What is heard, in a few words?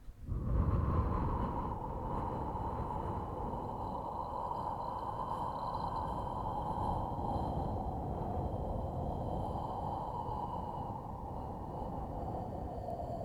Wind